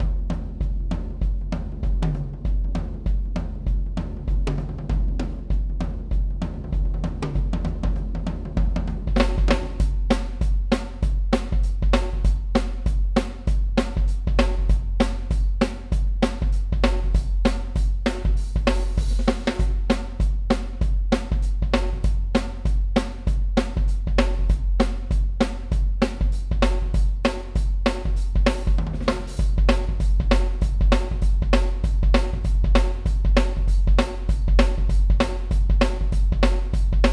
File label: ludwig classic rock 196bpm; toontrack superior drummer 3 test